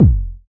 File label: kickdrum sample F sharp key, Royality free bass drum single hit tuned to the F# note; kick drum samples